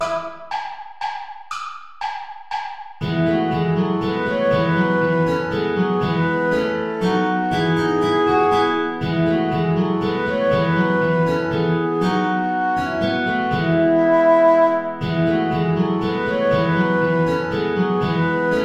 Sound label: saltarello play along without guitar